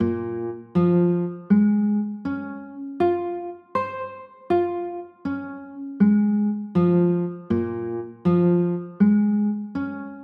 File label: a sharp m9, chords